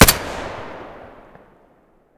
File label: muzzle glock17 stereo